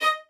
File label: violin